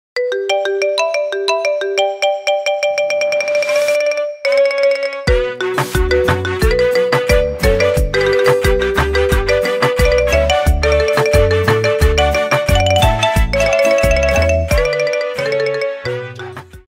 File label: ringtone marimba remix